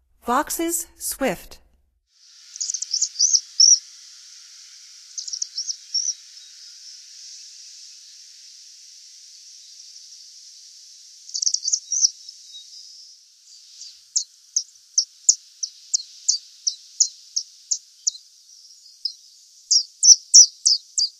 Vauxs Swift, Songs of Birds of North America; Stokes

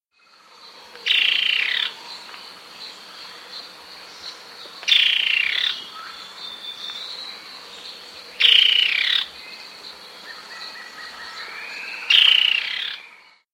Gray-Headed Antbird; S. America

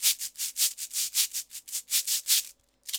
cabassa 80 bpm, latin

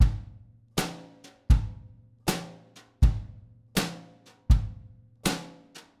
Drums, Jazz

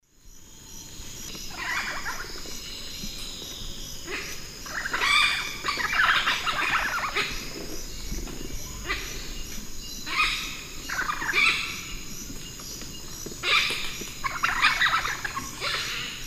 Orthopsitta manilata, calls 3 birds; S. America; Eastern Lowlands

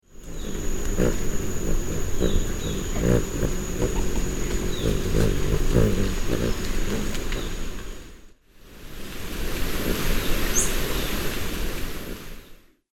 Phaethornis atrimentalis, hum and call female; S. America; Eastern Lowlands

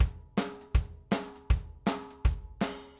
Drums, Rock

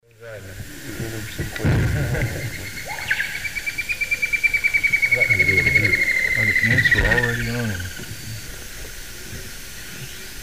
Dendroplex picus, song; S. America; Eastern Lowlands

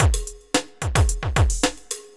evil beats

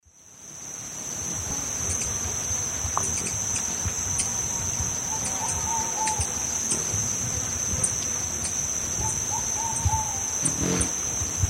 Avocettula recurvirostris, calls; S. America; Eastern Lowlands

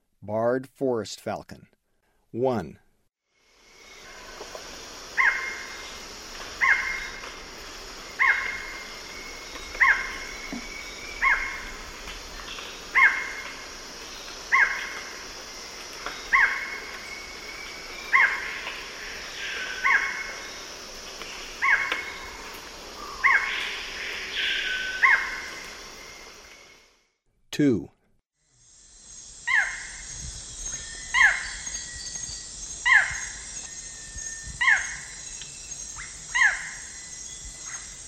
Barred Forest Falcon; S. America

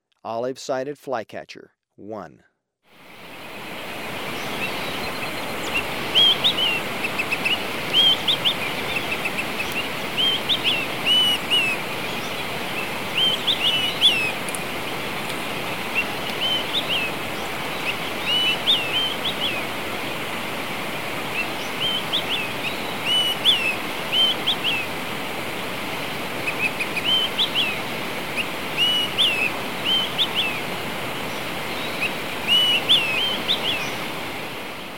Olive-sided Flycatcher, Birds of Ecuador; S. America

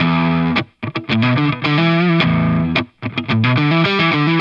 Guitar E7#9, Rock

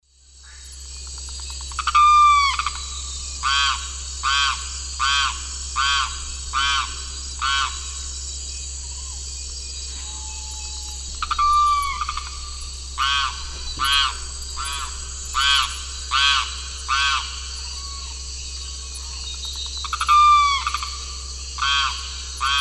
Aramus guarauna, song; S. America; Eastern Lowlands

